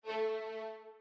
surprise

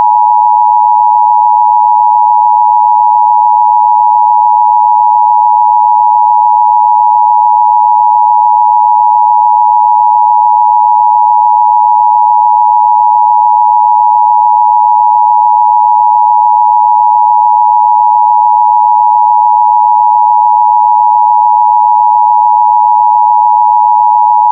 Emergency broadcast system

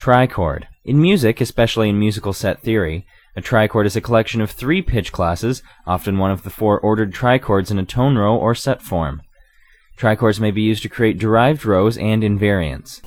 Trichord

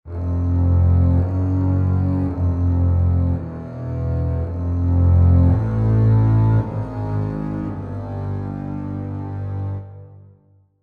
Melody Sea Serpent

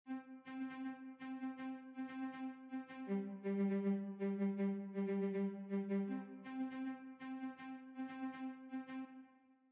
hero

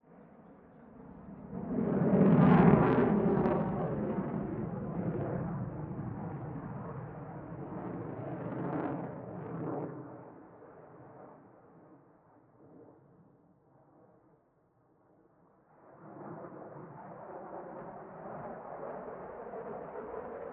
MIG 29, AIRSHOW, Air Force Fighters; Ivo Vicic

